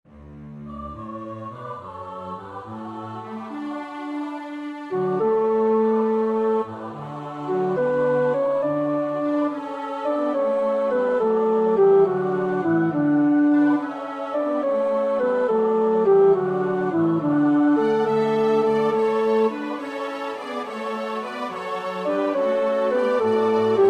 tenor; Purcell (Henry); King Arthur

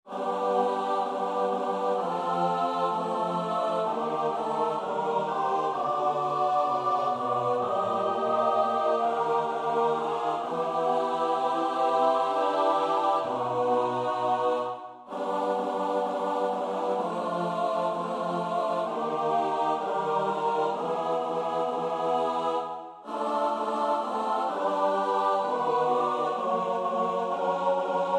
S-A-T-B, koorpartij oefening; Witt (Robert William); Four Motets to the Blessed Virgin Mary, opus 29; II. Ave Maria

